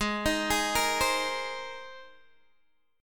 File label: G sharp Major Add 9th G sharp, Guitar DADGAD; G#add9 Chord